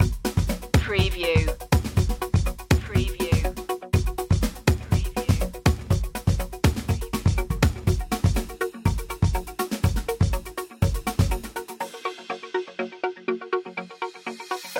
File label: bubbly underscore